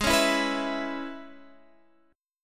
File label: E 6th, G sharp